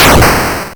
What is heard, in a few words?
Hard PCM Chip; chiptune